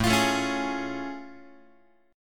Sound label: A Augmented Major 7th A Guitar; strummed; JGuitar